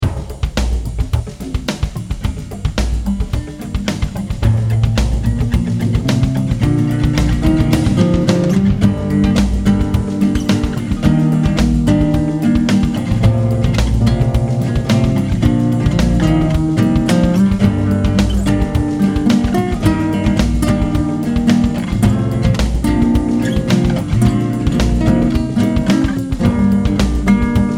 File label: World Beat